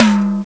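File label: TAMBOURINE; CMI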